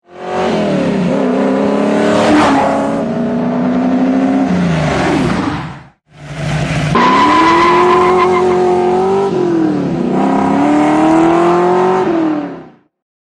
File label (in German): Shelby GT 500 - Aussengeraeusch; Springende Mustangs Shelby Bullit und Eleanor